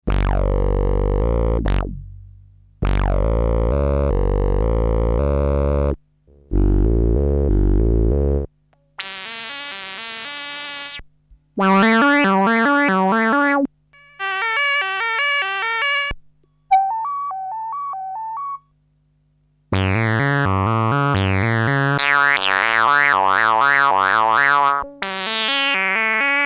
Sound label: kawai 100 p presets